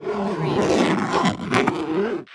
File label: Sports Bowling Bag Zip Shut